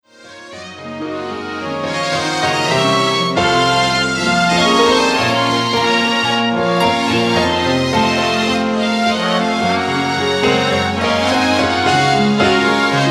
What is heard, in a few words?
piano concerto